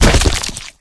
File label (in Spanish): caida